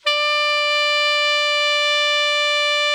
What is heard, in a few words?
ALTO MF D 4, Sax; Greytsounds; Philip Wolfe David Crozier's Saxophones